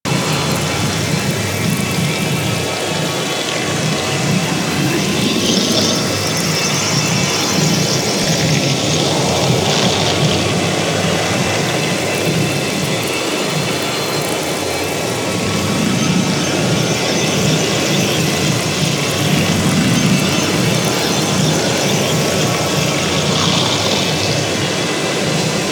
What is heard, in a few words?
Swarm Nest Stage Four Idle Loop; Entrati